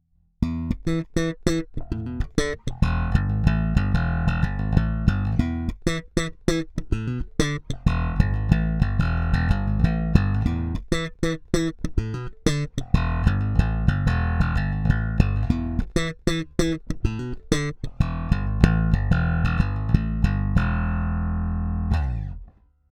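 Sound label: Both active Slap, bass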